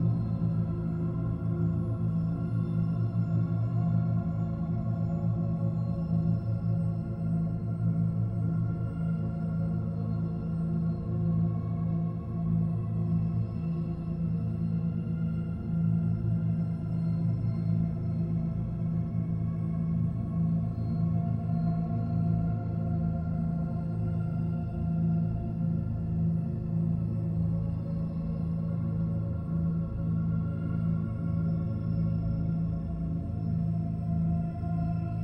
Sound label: Deep Loop; Void Bastards